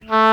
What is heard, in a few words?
alto flute oboe melody morph low